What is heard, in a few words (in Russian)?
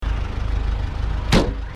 vaz dveri